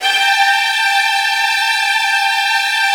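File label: Virtuoso Strings, violin trills, G3; ILIO; Kirk Hunter Virtuoso Strings VOL-1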